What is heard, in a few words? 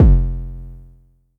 Low Kicks F1; LIMAT Div Dept; Drums